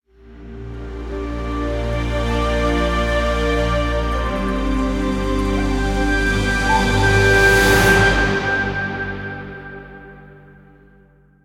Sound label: splash Intro Music; temple of nudges